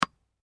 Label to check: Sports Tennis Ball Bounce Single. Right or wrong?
Right